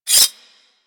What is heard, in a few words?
Whip Mech Rotate; Grineer